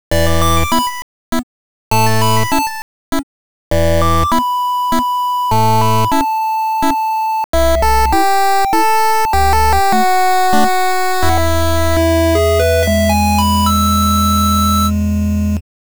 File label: Ocarina, 8bit; Legend of Zelda Ocarina of Time